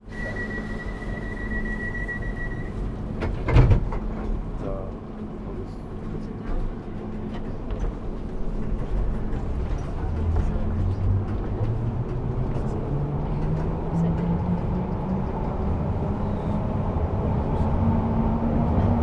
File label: soundscape one stop on a bakerloo line train in london